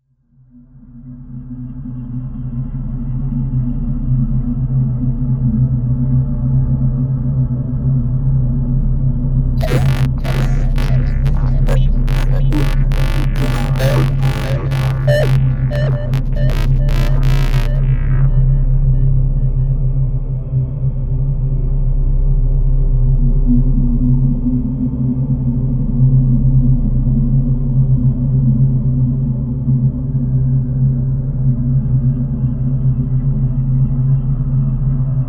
Ghostly Noise Mix, Ambient

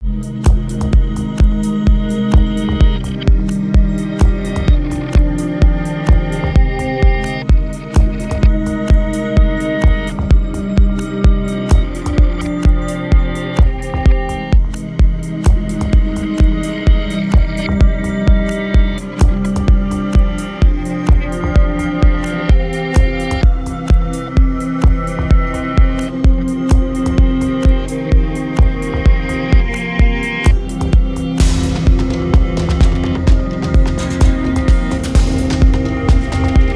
Somewhat Sad Backmasked Ghostly Dance; CUES for film TV games etc

